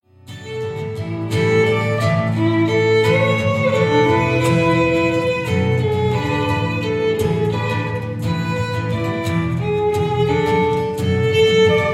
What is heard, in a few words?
Bittersweet Guitar